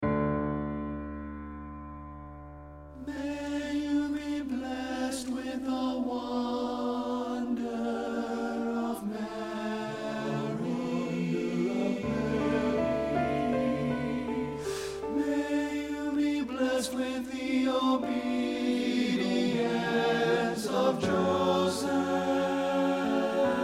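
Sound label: tenor; satb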